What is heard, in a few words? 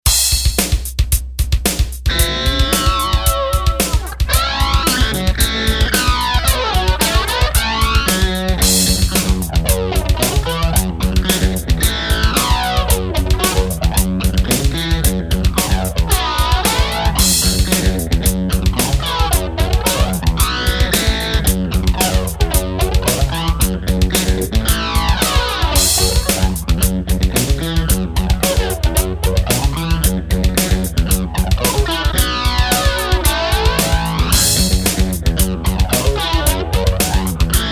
mini5 rock uk 70s spring flanger; vox